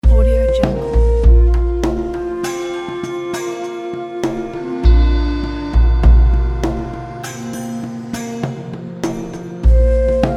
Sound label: chinese chill loop